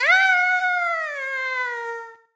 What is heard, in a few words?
fall; toadette; sm64coopdx; char select extra chars